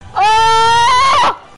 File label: noises